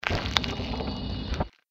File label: mirelurk idle; magmalurk; npc